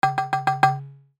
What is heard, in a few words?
cartoon knocking mallet x5; zapsplat